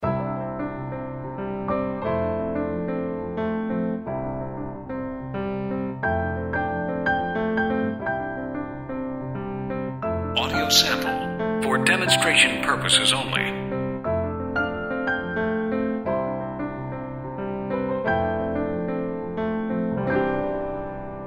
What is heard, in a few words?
SOLO PIANO; hold music for callers on cell phones